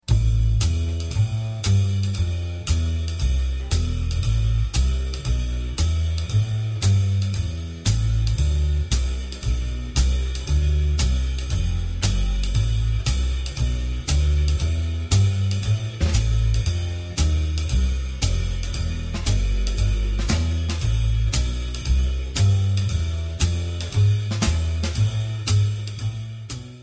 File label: Min Blues Bs Dr slow